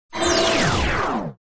Multi Fly Start

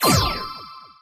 Appear Scatter Sound

